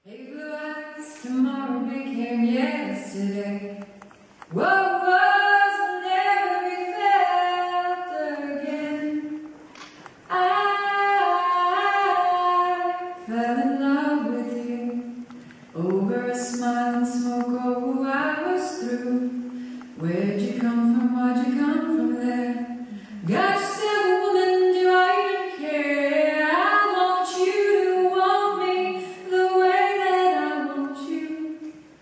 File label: sings to fans at film festival